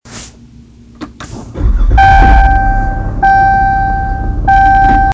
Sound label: weird sound as soon i start my new 2019 charger sxt; dodge; starting clic